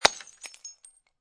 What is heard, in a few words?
Glass Bottle Drop Empty Break, Impact, Glass Beer Bottle, Empty, Break On Cement